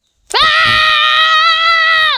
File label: angry shouting